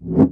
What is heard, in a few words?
swoosh